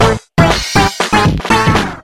pre minigame; tf; microtf2; wario

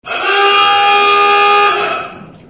alert; hl2dm

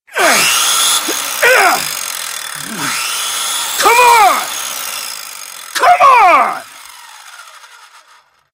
cannibal sight; frank

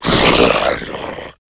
zombie vox male runner run front; rave in the redwoods

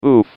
pain sharp; John Madden

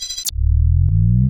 portalgun powerup

